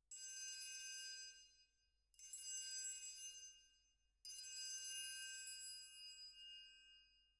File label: Perc